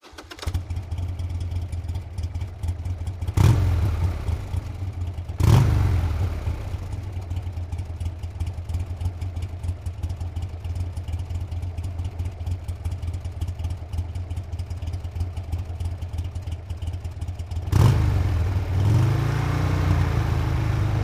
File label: harley start idle